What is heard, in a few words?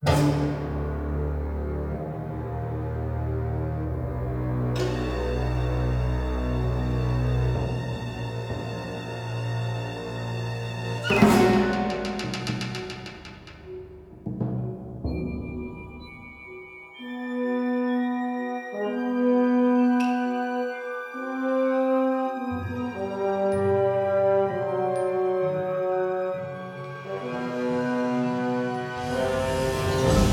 Ceylon Amb; music; XBOX